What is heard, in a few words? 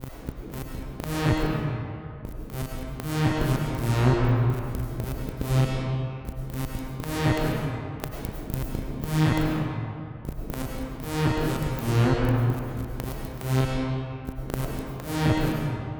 Progressive Synth, Trance